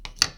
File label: go soft; browser go proto